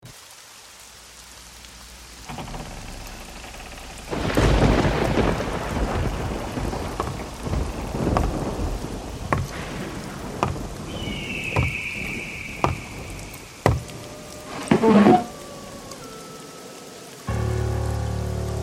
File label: Best Horror